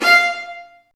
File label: String Master Series, Vlas Marcato; Vas2 Marcato